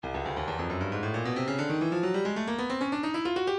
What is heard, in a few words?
breakbeat midi, 135 bpm; Stylus Breakbeat Midi Files 130 139 Bpm; Racer X